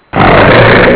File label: hit